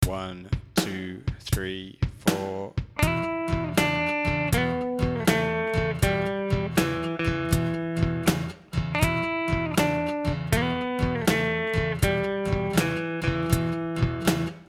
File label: blues e slide, guitar